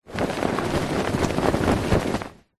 foley clothing shirt shake

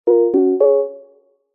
multimedia game sound soft warm subtle positive

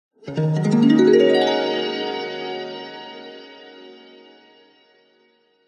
sound design harp glissando dreamy washy; zapsplat

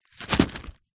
foley glass bottle in paper bag drop carpet; zapsplat

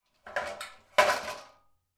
industrial metal ladder movement

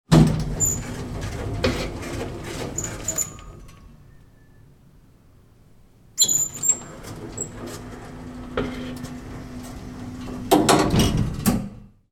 industrial machinery elevator door open and close; sound effects one

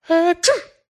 human FEMALE sneeze

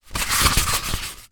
toilet roll card centre handling; foley; zapsplat

